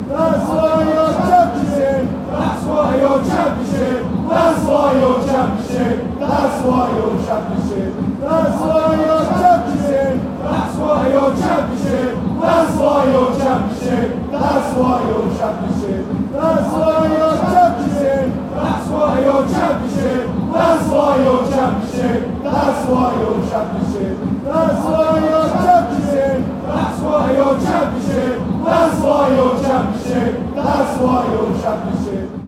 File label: fanchants